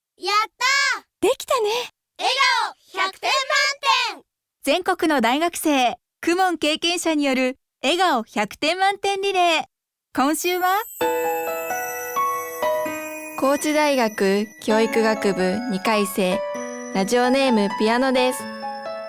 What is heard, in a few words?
piano